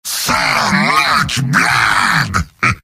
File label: cheers; heavy; video games; source engine; tf; mvm